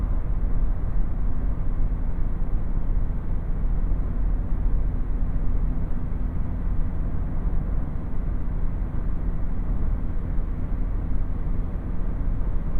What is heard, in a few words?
Midsize Car 100Kmh binaural